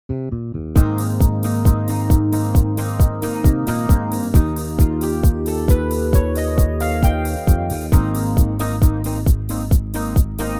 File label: Ab A smooth